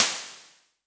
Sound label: Crashes; LIMAT Div Dept; Drums